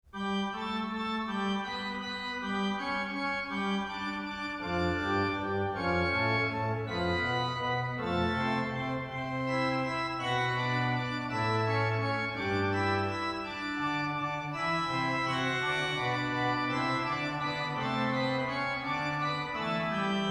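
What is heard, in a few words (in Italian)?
fanfare, organist; 3) Fanfara canonica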